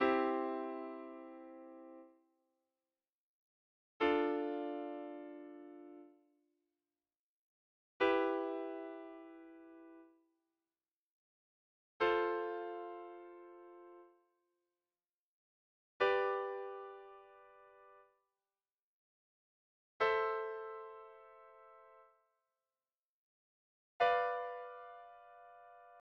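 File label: Fortepiano Triads for listening; Trezvuchiya dlya slushaniya vo 2 klasse horeografiya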